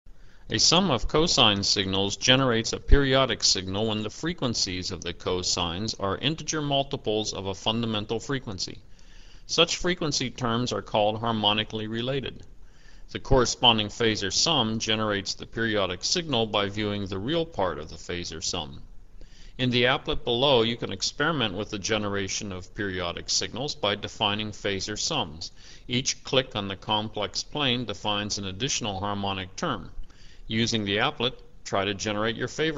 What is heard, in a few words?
harmonic phasor sums